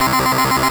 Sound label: M-Audio Venom Atari C2